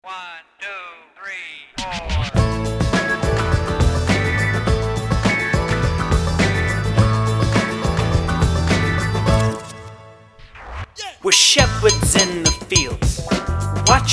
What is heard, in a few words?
Ba Ba Beat, Preschool Choir